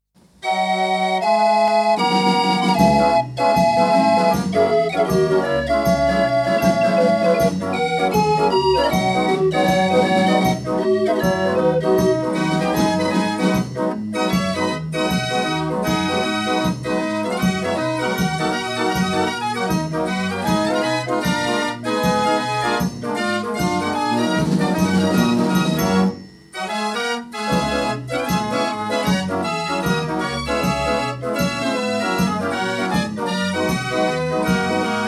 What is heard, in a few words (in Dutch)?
draaiorgel; de paardenkop